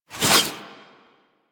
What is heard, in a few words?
Warfan Whoosh; Warframe; Weapons; Melee